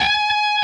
Bend full Left-hand vibrato

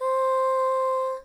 Choir; osu

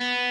Arp.

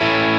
0 2 2 2 0 A

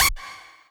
lead

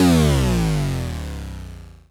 Big Square Drop

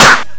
snare

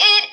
vocal

